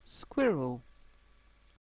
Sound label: squirrel